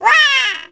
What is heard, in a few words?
pain major; perte